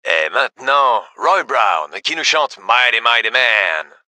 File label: music